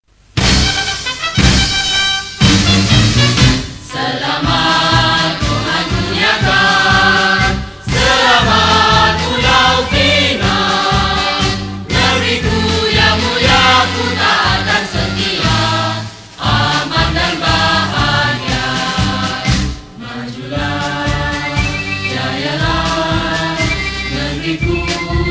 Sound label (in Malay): vokal; pulau pinang